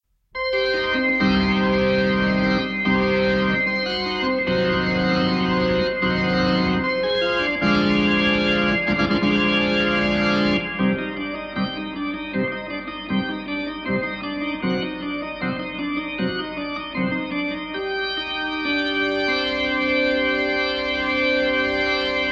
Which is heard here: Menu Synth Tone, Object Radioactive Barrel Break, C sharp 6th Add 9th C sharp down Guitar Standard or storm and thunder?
storm and thunder